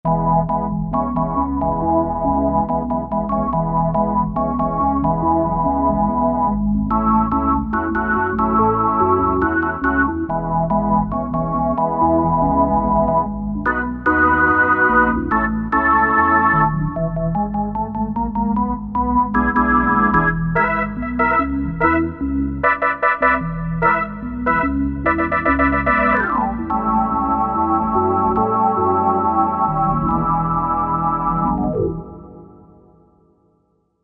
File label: Analog Org., Korg MSC-9S Organ